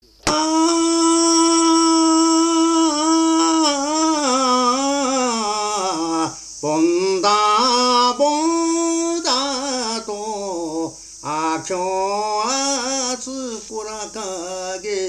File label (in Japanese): bonodoriuta, minyou